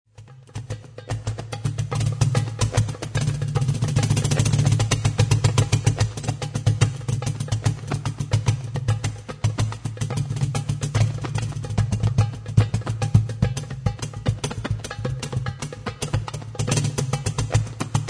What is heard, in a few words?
maqsoum; thala; With Rony Barrak in Rhythm Sticks, London